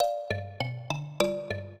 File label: trio, mbira; Mozart